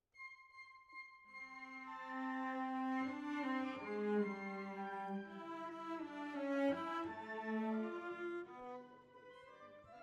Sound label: Cellos